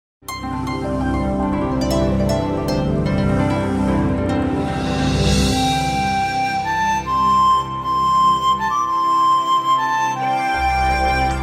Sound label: No Perc